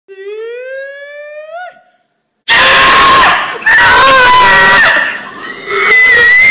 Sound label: hog calling contest ear rape